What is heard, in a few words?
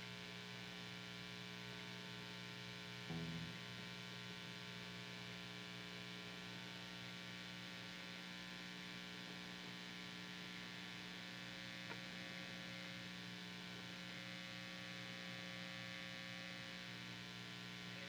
guitar